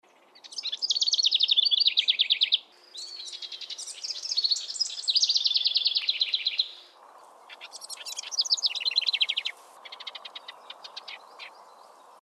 house wren; calls; Audubon

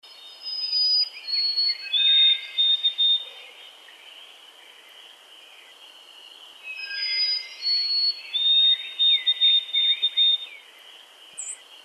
white throated sparrow; calls; Audubon

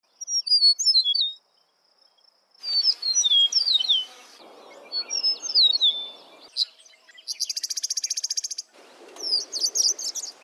eastern meadowlark; calls; Audubon

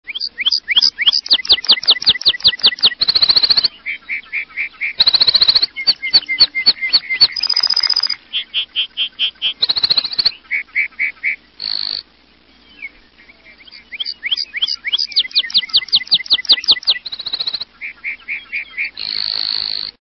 lark bunting; calls; Audubon

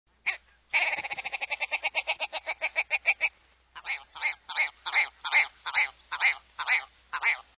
willow ptarmigan; calls; Audubon

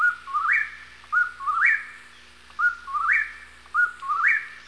whippoorwill song; calls; Audubon

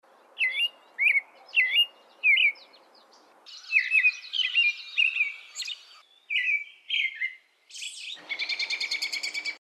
am robin; calls; Audubon

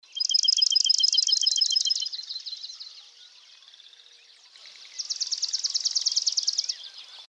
swamp sparrow; calls; Audubon

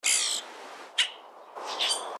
common grackle; calls; Audubon

